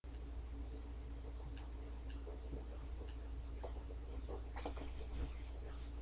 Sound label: knife